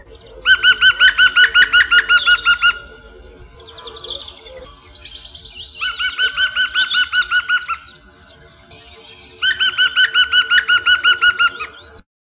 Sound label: yaffle